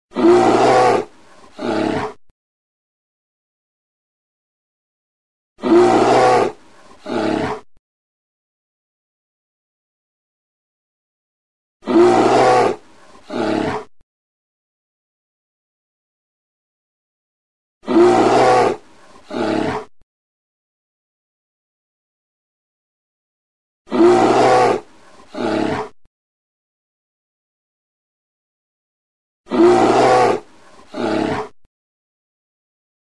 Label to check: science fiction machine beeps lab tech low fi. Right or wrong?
Wrong